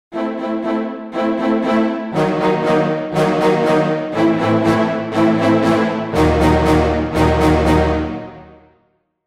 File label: action tritone